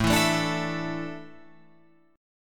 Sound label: A Suspended 4th, Guitar Open F; Asus4; strummed; 9,11,9,9,12,11